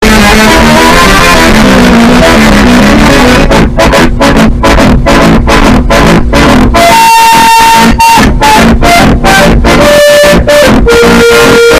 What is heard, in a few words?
italian earrape